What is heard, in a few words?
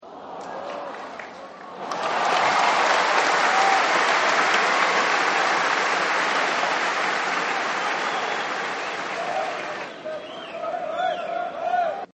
Applause Erupt; Applause Dissapointment; Applause Sound Effects; Human